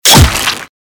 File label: attack claw hit; slender; amnesia